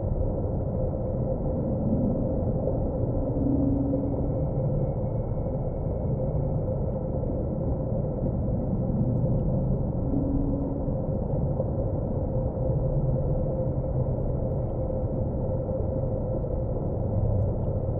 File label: ship construction ship idle; aquatics